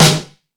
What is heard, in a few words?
Lazer Drums, E-MU Pro-Cussion; drum machine